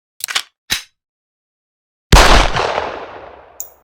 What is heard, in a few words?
desert eagle gunshot